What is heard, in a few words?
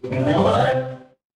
feedback level up